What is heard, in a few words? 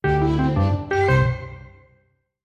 sting dartboard win; The Sims 3; Windows